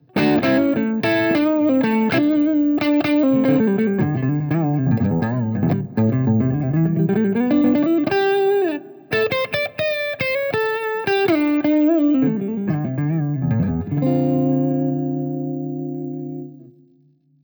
crunch neck; vintage

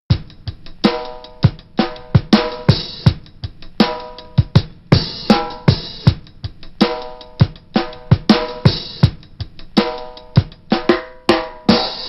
test drum; magix; packplug

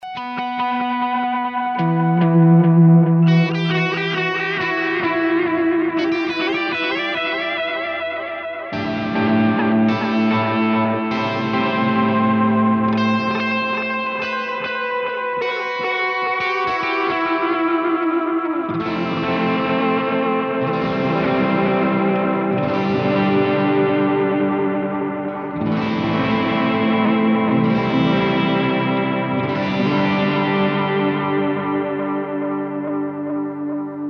Echopark tape strat blues driver; line6; tonecore; pod

